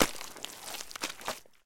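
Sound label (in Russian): спокойный короткий приглушённый бульк воды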